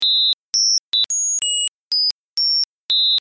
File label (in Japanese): 人工アオジ; 鳥の声を人工的に再現; 鳥の声研究室; アオジ VS 人工アオジ